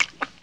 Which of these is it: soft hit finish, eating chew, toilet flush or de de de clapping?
eating chew